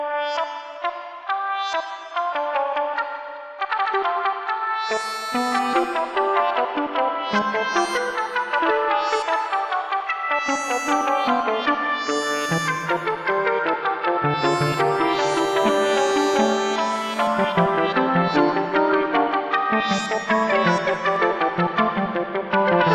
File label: Fugue in D Minor Synth Version; BWV851B; Bach